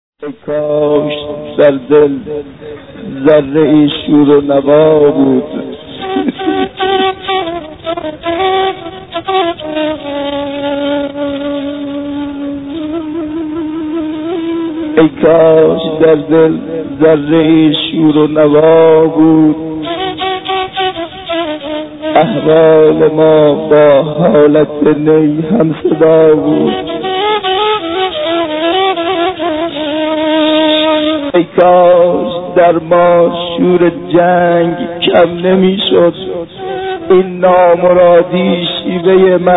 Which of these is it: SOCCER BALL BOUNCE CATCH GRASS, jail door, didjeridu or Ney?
Ney